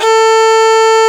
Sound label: Violin D3